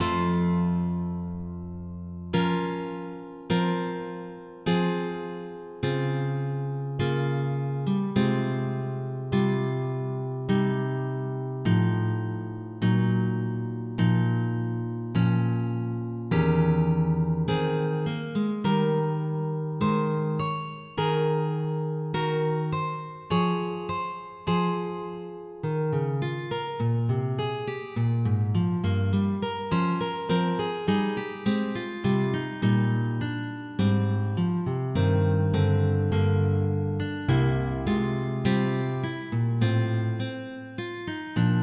Toccata

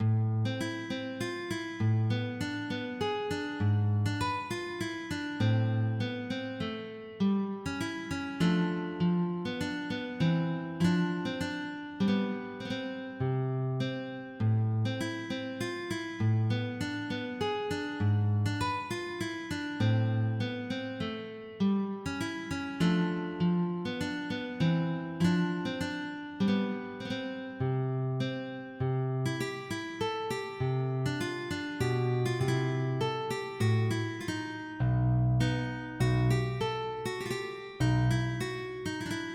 Trio, MIDI

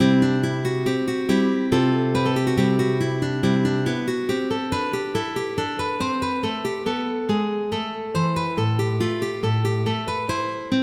Calata, MIDI

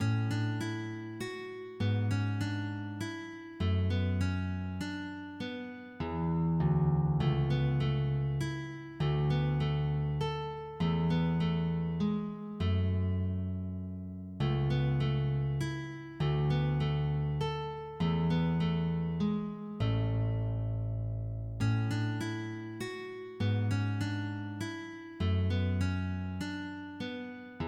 Menuet

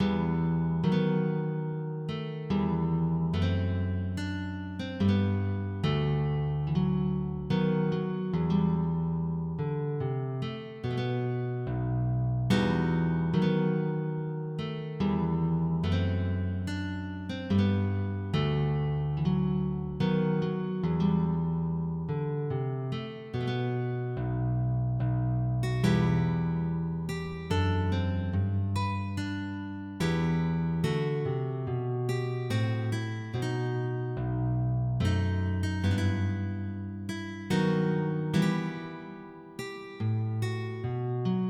Sarabande, MIDI

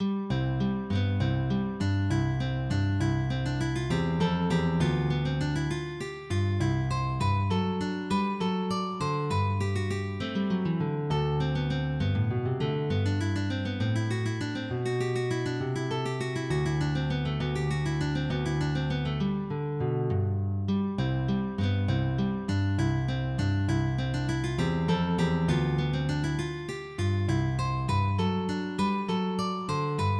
Gigue

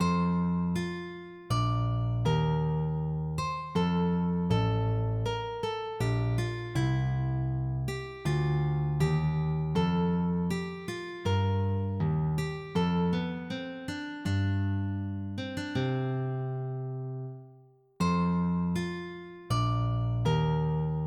Sarabanda Largo

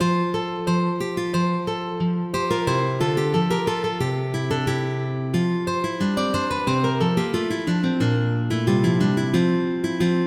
Ostinato, MIDI; vo seguire

